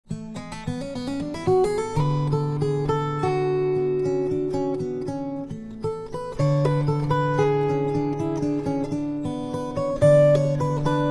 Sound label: cpx15wa; YAM